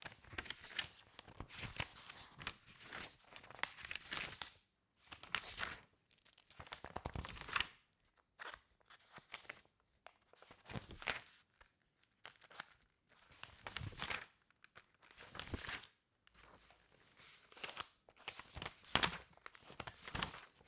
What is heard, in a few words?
Page Turns